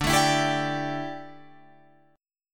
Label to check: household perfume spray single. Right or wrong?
Wrong